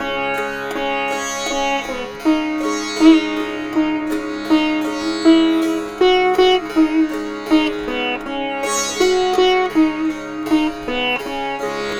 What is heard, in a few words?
Sitar, East Indian; Sonic Foundry (Sony Creative Software); Continental Drift Aftershocks